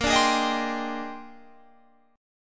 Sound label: Bb 13th, Guitar, Half Step Down